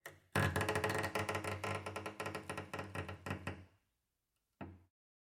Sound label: Cello Scratchy Noises; SoundsCrate